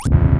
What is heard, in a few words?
shield cap unready; starsiege